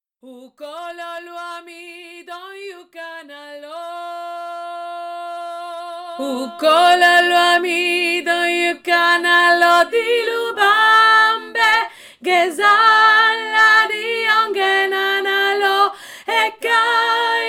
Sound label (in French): UKHOLO LWAMI VOIX AIGUE